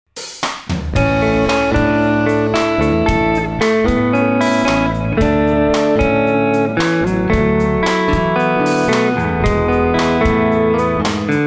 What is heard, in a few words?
Gibson Les Paul Strymon OB1 Eventide H9 Fender Deluxe Reverb